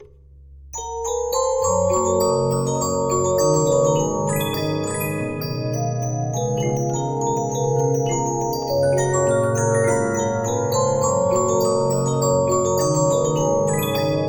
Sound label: Regina, 15inch disc musicbox